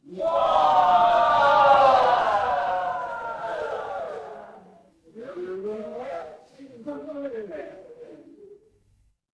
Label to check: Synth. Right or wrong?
Wrong